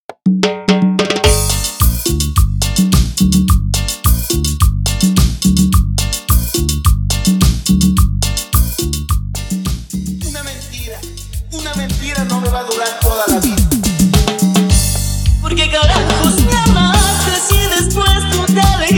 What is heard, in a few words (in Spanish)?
Intro Acapela; 106 . 91 Bpm